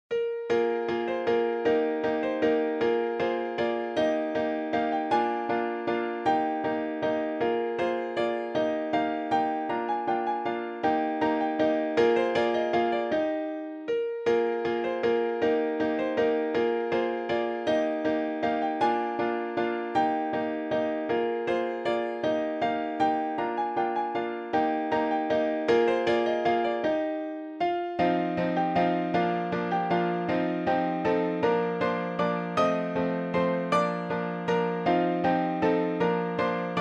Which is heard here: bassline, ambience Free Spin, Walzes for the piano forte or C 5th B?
Walzes for the piano forte